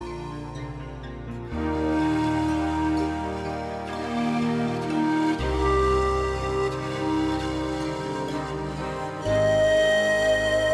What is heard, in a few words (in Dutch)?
Panfluit